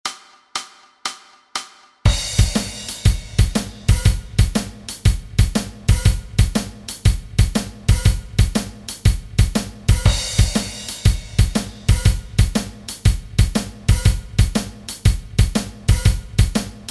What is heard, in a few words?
Shuffle Drums 120